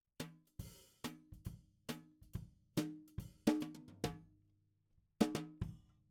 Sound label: SNR top